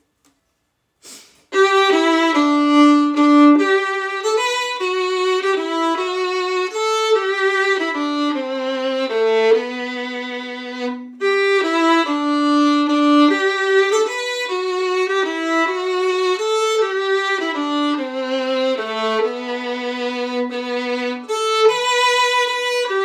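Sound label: Southwind violin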